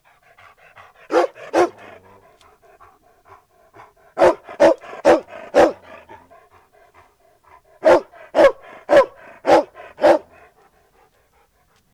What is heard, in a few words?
dog